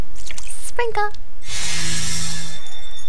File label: sprinkle